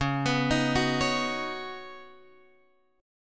C sharp 9th Suspended 4th C sharp down Guitar Open F, chord; C#9sus4; arpeggiated